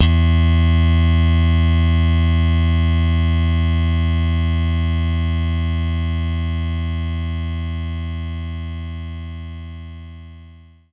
Slap Bass E3

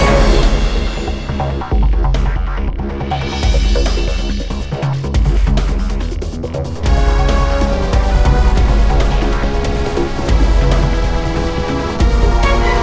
Music Vehicle Chase

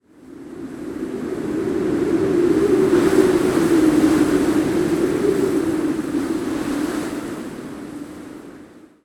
wind; Level2 Ice Fields

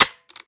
Button

